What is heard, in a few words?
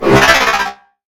att, enemy droid; combat